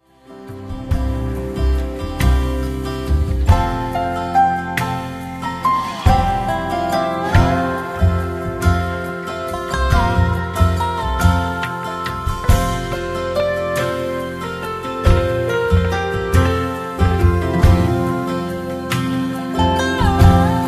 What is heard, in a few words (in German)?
Instrumental